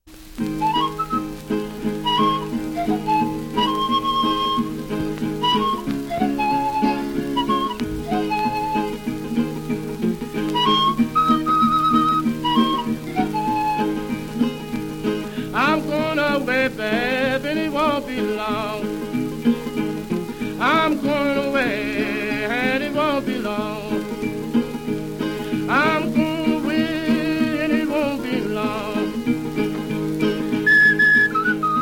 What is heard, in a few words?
Blues